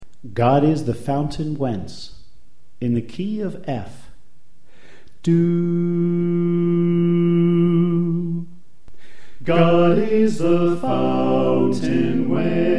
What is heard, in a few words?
Soprano Left